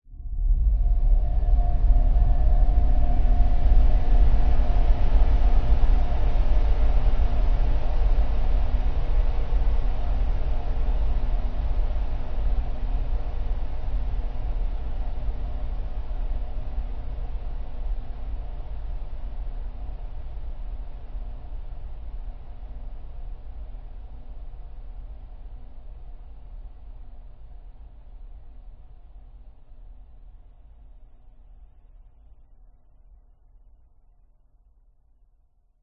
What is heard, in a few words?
cinematic droning swell long decay airy horror dark sub bass; sound design